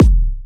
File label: Techno Kick; Advent Calendar - Day 6 - Techno Kit; Kick One-Shots